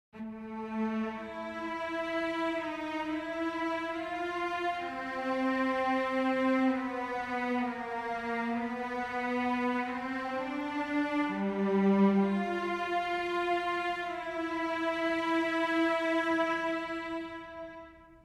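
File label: Cello Legato Mix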